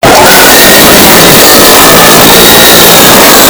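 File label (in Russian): otryzhka glodzilly, burp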